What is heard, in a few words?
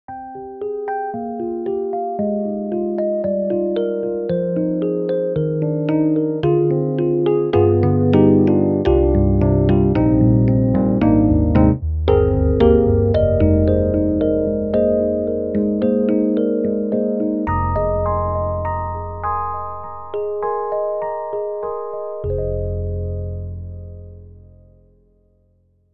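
Rhodes Wurlitzer; EDIROL; Super Quartet; Rholitzer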